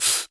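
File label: vox percussion, Vocal Planet Jazz FX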